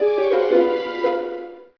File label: player Die; Feeding Frenzy